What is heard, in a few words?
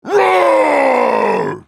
ultimate effort; Trundle; en US